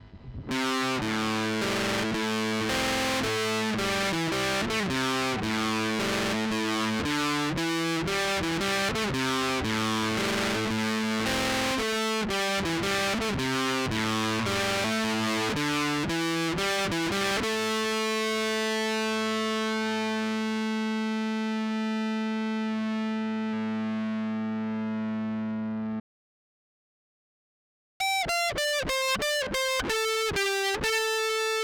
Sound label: fuzz